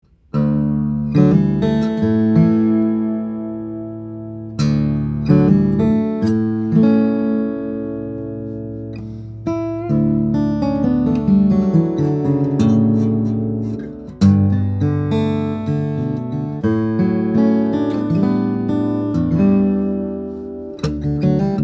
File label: OM, guitars, steel-string guitars, flattop; bresnan; brazilian rosewood; german spruce